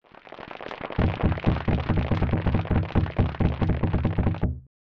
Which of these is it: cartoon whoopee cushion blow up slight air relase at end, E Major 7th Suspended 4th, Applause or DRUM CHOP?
Applause